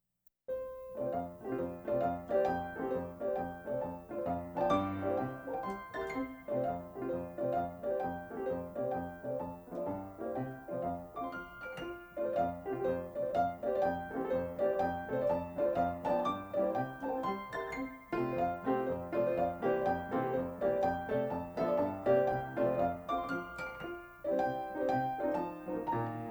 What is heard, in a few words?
etude, historical instruments; Chopin etudes